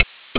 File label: click on